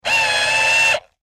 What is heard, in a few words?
Industry Electric Drill Tighten Screw Whine Stress Short